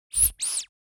Rubber duckie toy squeeze and squeak

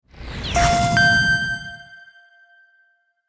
Podcast intro outro sonic branding

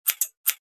Large scissors snip

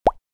Bubble pop

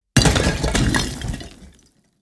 Large block thrown on concrete, brick

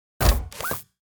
Sci fi gizmo mechanical movements

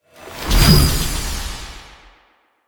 Ray blast

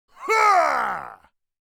Orc creature long shriek during an attack

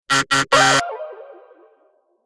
Game show wrong answer; film and tv

